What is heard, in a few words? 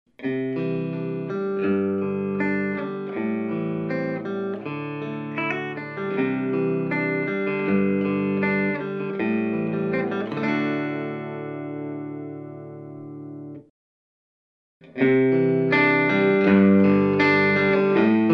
arpeggio bridge single coil